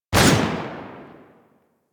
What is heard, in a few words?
Hand Shotgun Alt Shot